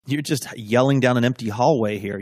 yelling down empty hallway

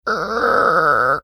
mimicking creaking sound

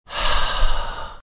sighing

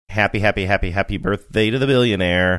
singing happy happy birthday; to billionaire

